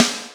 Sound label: Yamaha CS6, Drums